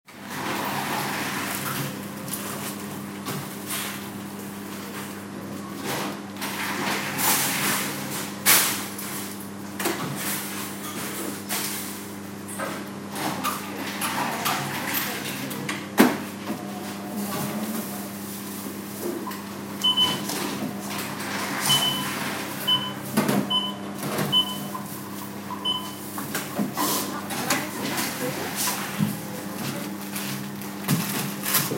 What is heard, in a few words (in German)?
Supermarkt